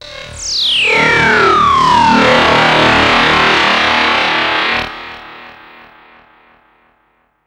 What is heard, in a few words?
FX, Drum & Bass